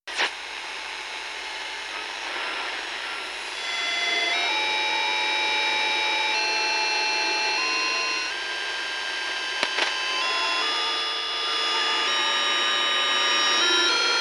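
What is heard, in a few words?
Radio short wave Noise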